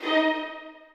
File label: strings